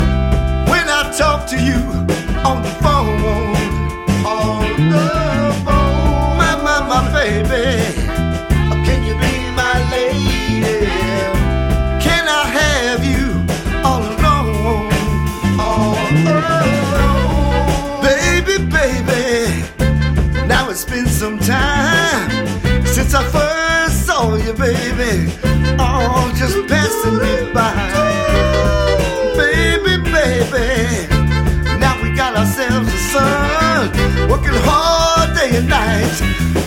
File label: vocal